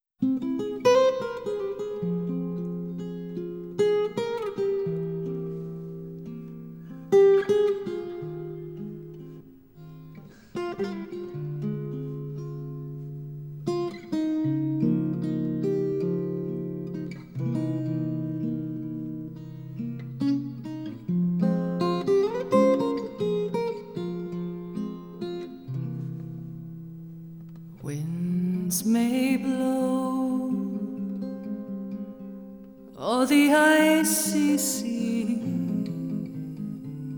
Female Voices; Five Songbirds A Reference Collection of Female Voices

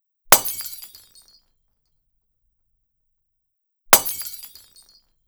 Buld drop

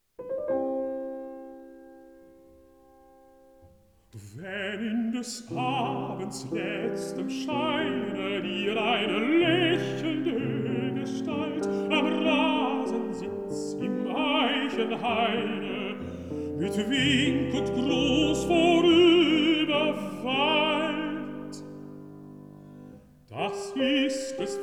song for voice & piano

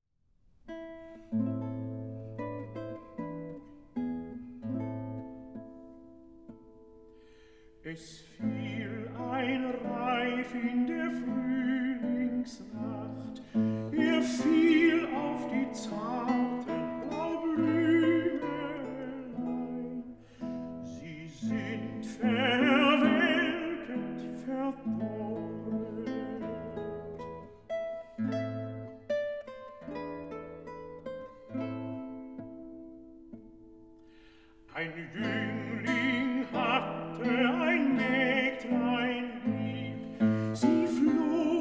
song, Alsatian folk song

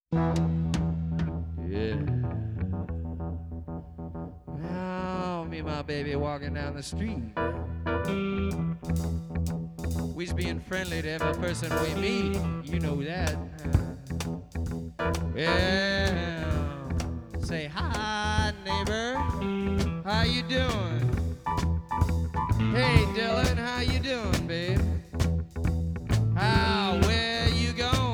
Talking Blues

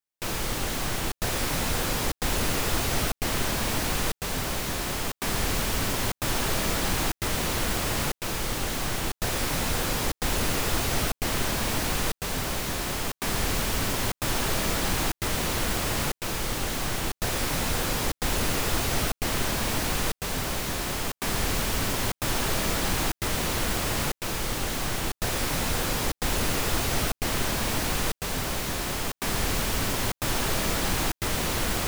Stepped wideband stereo pan; Stereo Setup and Imaging; Surround Spectacular

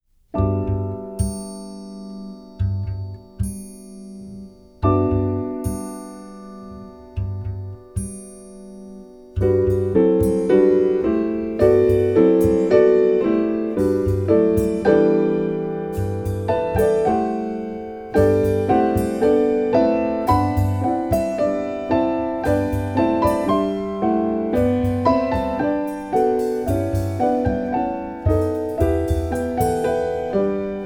Variations, for keyboard

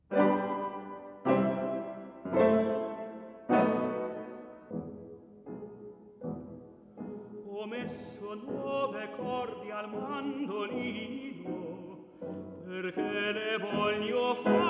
song cycle for voice & piano; Biondina; Ho messo nuove corde; Songs by Charles Gounod

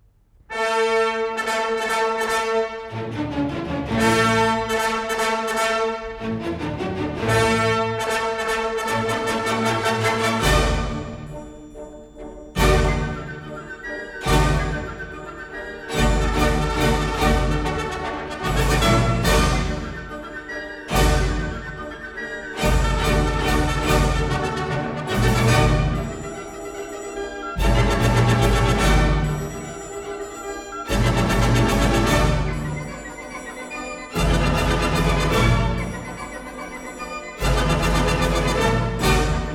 Marche, ballet; Giselle; Marche de vignerouns

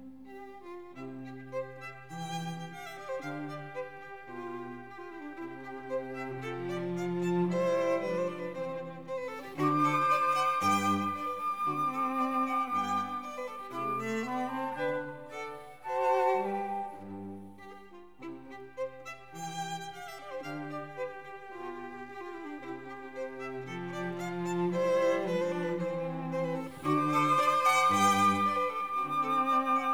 flute, violin, viola & cello; Quartet; No． 3 in C major, K． Anh． 171 (K． 285b); Mozart; The Flute Quartets

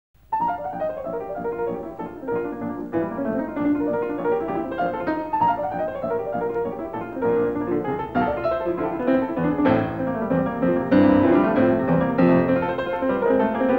Presto for Piano in B flat major; Poulenc

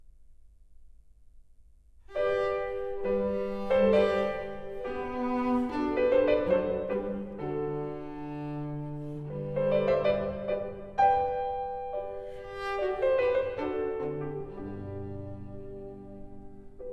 Variations for cello & piano in G major; on Handel's ＂See, the Conqu'ring Hero comes,＂ WoO 4; Beethoven

